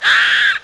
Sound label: Rabbit pain